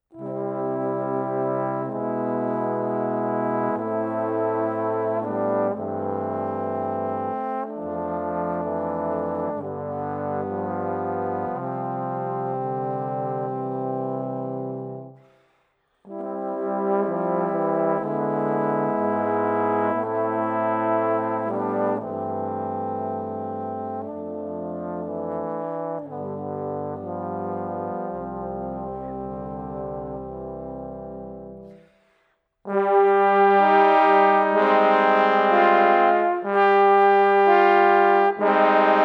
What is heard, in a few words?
Quartet